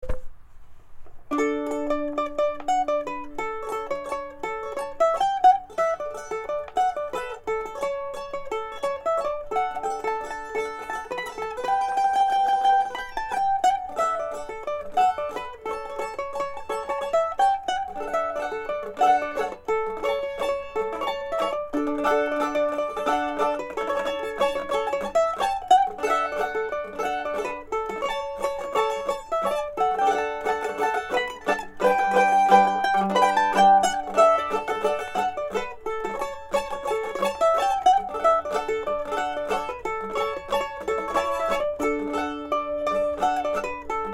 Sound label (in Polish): mandolina; czas wolny